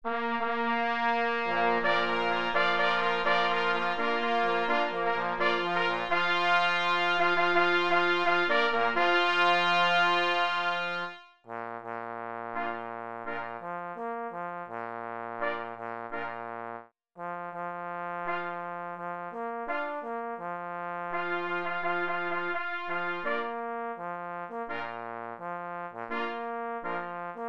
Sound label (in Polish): Fanfara dla zubra 3 plesy i 2 parforsy; A. Kanawka; Artur Kanawka (ur. 1970) – waltornista, dyrygent chóralny i orkiestrowy, kompozytor